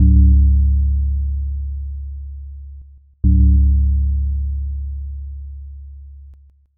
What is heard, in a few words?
belltoll